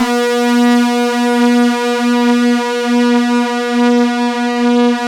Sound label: SYNC DEEP, PADS; Masterbits; Vintage Voltage